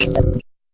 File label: fx drum